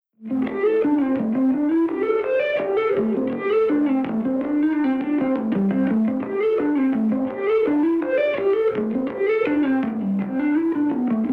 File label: recirculate lofi everything